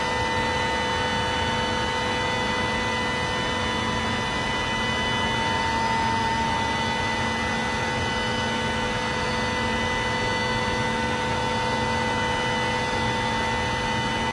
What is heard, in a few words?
Amb Turbine; Silent Hill Homecoming